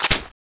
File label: boltpull; cstrike